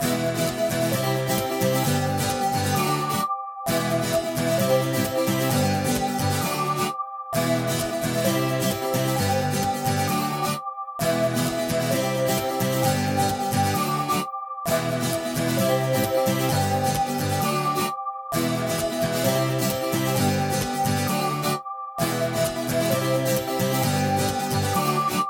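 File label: Ambient Guitar Ringtone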